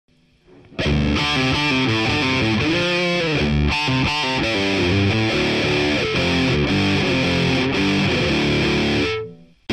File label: AKG C3000 - Gain (Bridge)